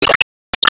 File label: Spike Bomb; disney star smash android ios mobile gamerip 2020